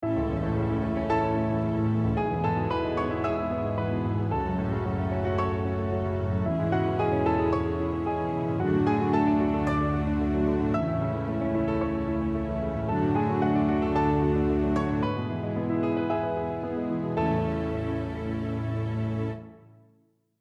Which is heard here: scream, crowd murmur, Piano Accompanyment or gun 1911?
Piano Accompanyment